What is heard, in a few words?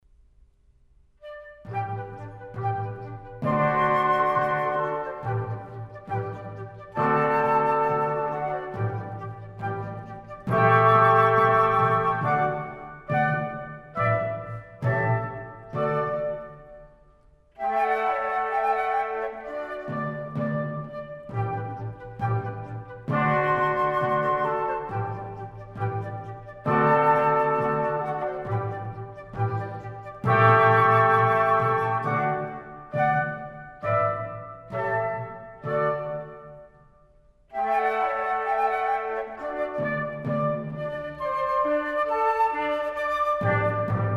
Divertimento in C, Andante; Mozart; Serenades and Divertimenti for Wind